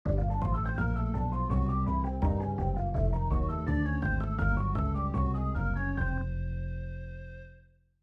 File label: BLUES 12